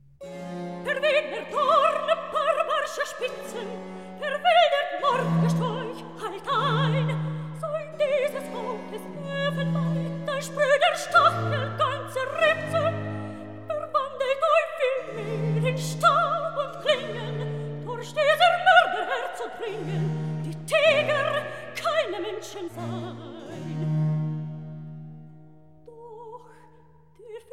Recitativo; Tochter Zion Verwegner Dorn; Klassika; Handel Masterworks

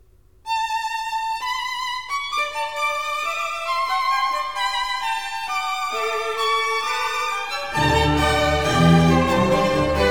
Allegro, Conciertos Grossos; Concerto No.1 in D; Arcangelo Corelli; 12 Conciertos Grossos Op.6 (1966)

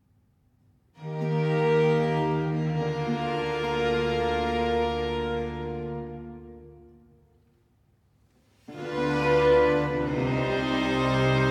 Sinfonia 3. Adagio; Arcangelo Corelli; Corelli - Complete Works; Klassika

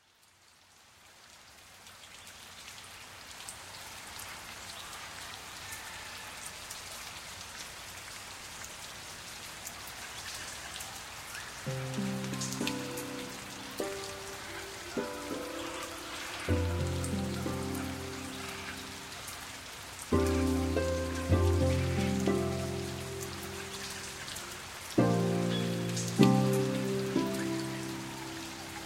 Waterbirds Rain Forest, The Sounds Of Nature; Rain Forest Retreat

